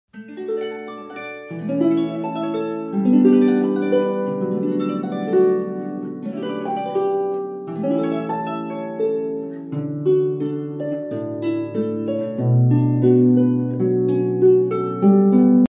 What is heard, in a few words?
canon, harpist